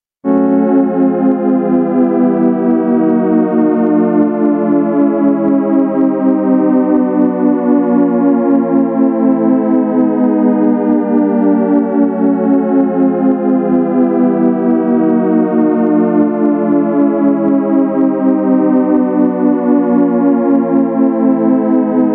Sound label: Glissando Shepard Risset